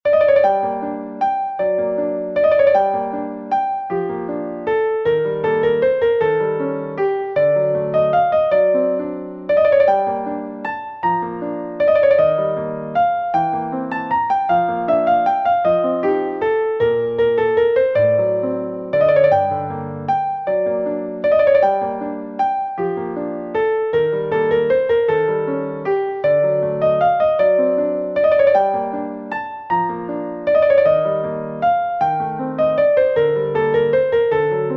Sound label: Arabesque in G minor; long version